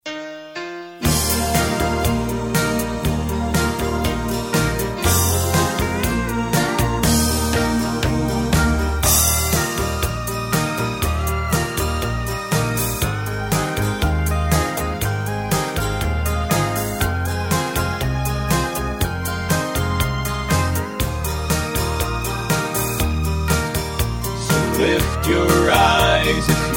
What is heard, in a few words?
harmony